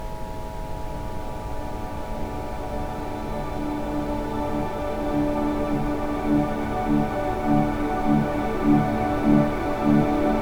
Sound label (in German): mit Ambient Sound; chakren; Ajna Opening